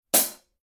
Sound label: 900 series 14 heavy hi-hat closed; paiste